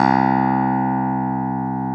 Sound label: CLAV C2; Sonic Reality; Vintage Timetraveler; CLAV PIANET